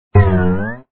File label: wrong; Human Body Quiz